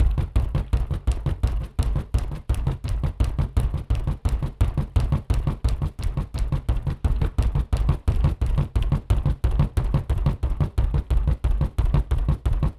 WHEEL; SIMUZENSOUND

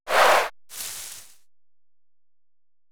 COLLISION WHEEL EARTH, Metal Skid Earth; SIMUZENSOUND

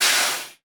AIRBRAKE; SIMUZENSOUND

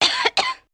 COUGH WOMAN; SIMUZENSOUND

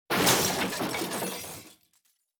COLLISION CRISTAL; Metal Skid Dry; SIMUZENSOUND

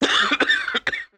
COUGH MEN; SIMUZENSOUND

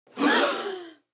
CROWD SHOCK; SIMUZENSOUND

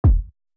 COLLISION BASS LITE; SIMUZENSOUND

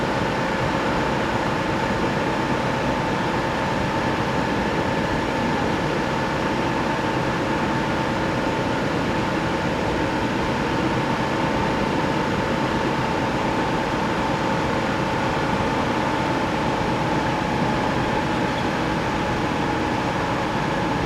SPEED FAST; SIMUZENSOUND

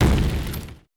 COLLISION HIT MID

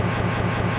MOTOR IN; SIMUZENSOUND

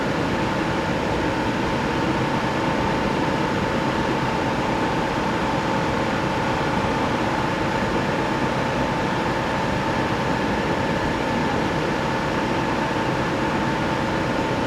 DRIVE MOTOR; SIMUZENSOUND

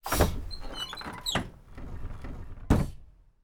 BUS CLOSE DOOR; SIMUZENSOUND

